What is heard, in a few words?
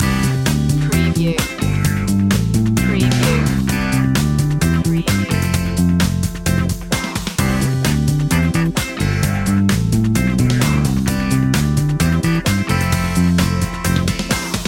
happy shopping loop; young people